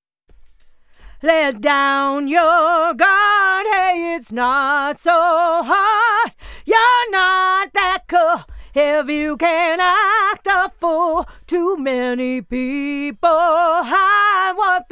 Lead Vox

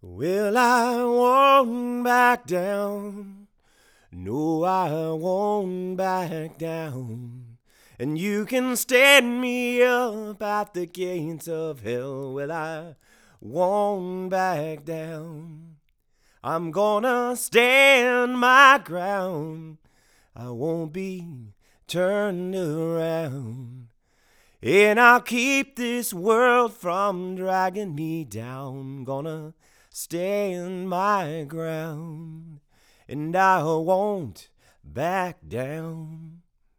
Vocal; MA-201fet

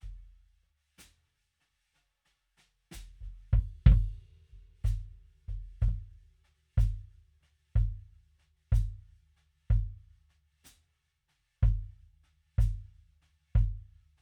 TubeTech EQ Kick

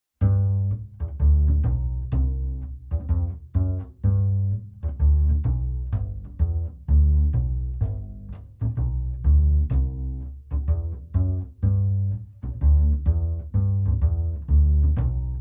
Upright Bass EQ; Daking

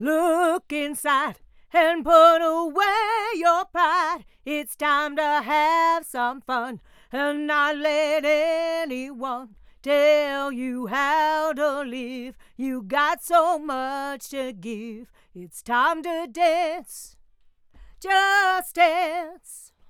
Lead Vox Verse

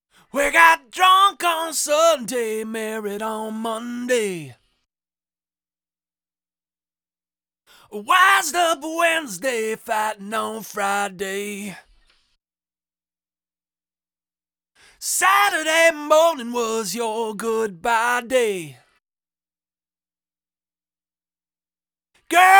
EQ Lead Vox

